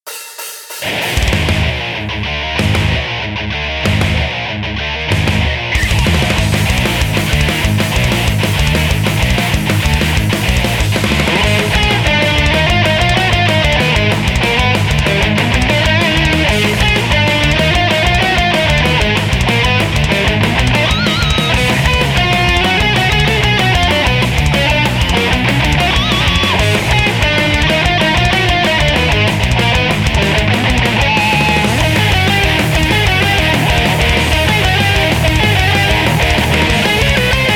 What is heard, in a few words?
slam shuffle